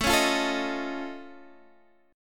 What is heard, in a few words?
F Augmented 7th A, chord